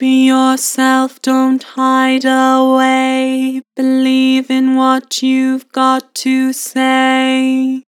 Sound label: Vocals Flat Be yourself; Harmor